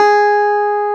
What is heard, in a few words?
CLAV G#4; Sonic Reality; Vintage Timetraveler; CLAV PIANET